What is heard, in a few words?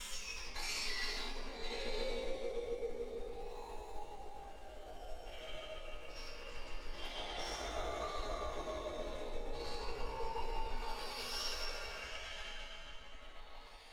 waves